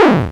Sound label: alarm